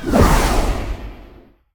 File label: hit